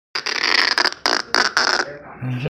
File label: charging up